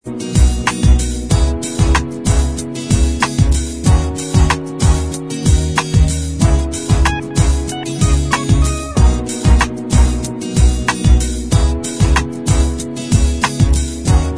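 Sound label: instrumental, backing tracks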